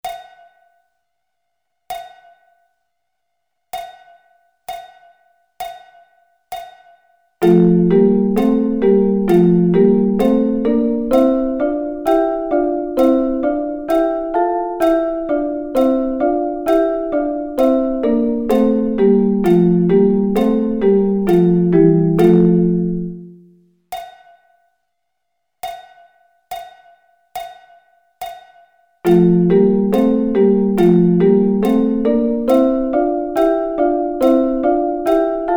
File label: Double Stops